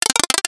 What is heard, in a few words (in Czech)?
Akordy F dur